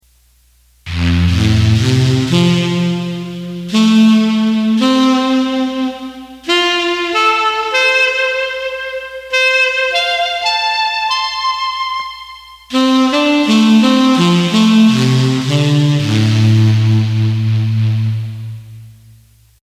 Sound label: ketron Tenor Sax